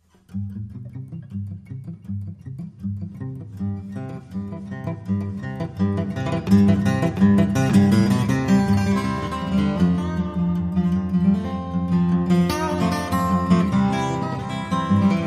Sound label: Fast Guitar